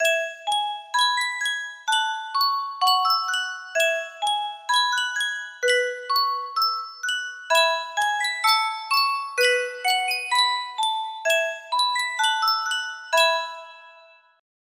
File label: Sankyo Music Box, music box melody